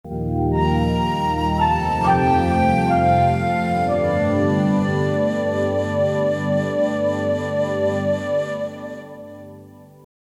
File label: Happy Duck Ending, music